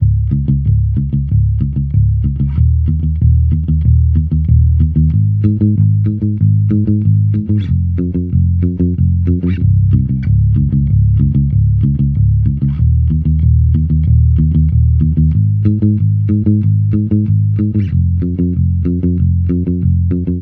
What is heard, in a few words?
Amped loop 94 Ab, Electric Bass Loops; Slate Digital BASSHOLE Sample Pack